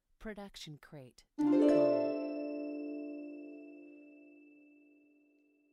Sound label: fantasy gliss; soundscrate